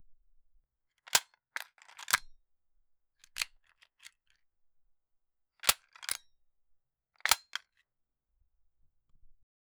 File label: pistol magazine ejecting